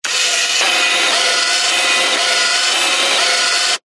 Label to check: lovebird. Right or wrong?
Wrong